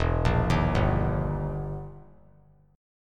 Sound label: E Minor Major 7th Flat 5th, E, down; arpeggiated; JGuitar